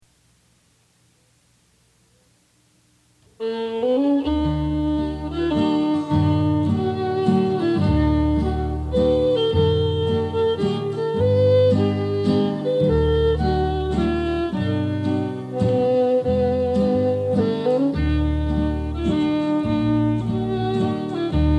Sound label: Waltz